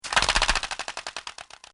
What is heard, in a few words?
Shade Window Flapping; Windows; Household